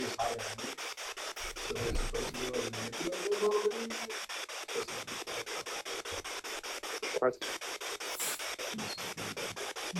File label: Tattoo Shop Spirit Box